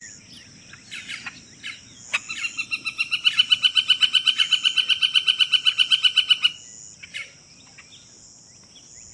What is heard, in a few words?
Northern Flicker Colaptes auratus auratus